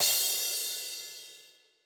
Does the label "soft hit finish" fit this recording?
Yes